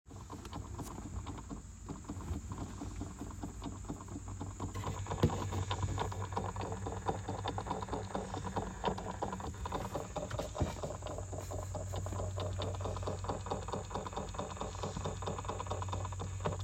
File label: noise lower glove box lr4 noise